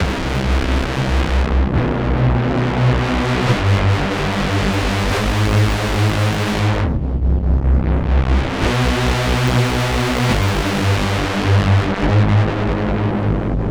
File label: Dark Rave, Drum n Bass, Pads; KJ Sawka; Drum n Bass & Beyond